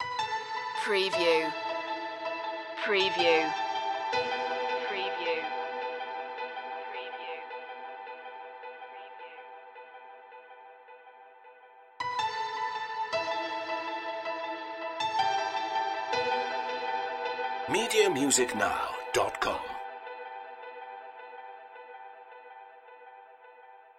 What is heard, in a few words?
abyss loop